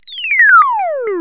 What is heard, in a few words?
BLEEPS DOWN; Zero-G Ambient 1